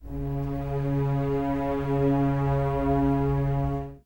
String

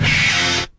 guitar strike

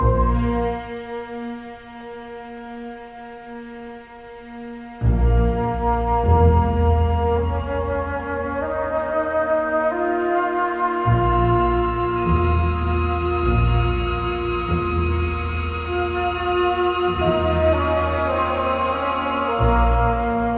strings flute, sad loop; Loops

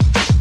clap to this, Kick Snare; Drum loops

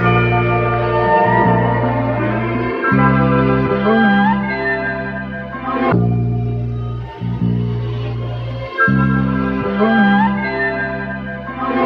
guitar, Loops

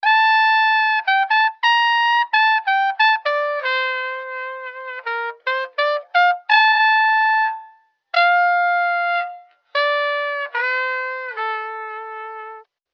horns, loops, phonky

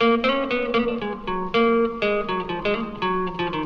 guitar, Jazzmatazz loops

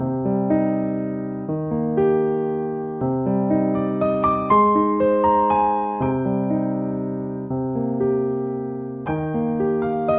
piano preludio, sad loop; Loops

